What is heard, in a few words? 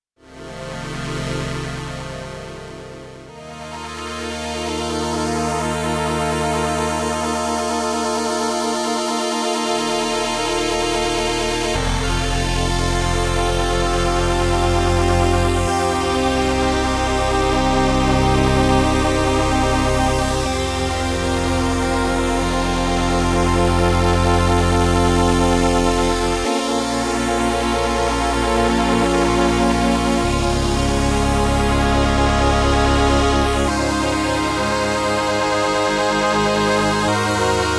OB-X8 String Mashine SEM